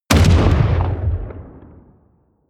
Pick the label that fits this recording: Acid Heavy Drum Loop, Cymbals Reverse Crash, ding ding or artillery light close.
artillery light close